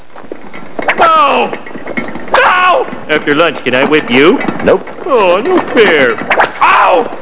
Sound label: whip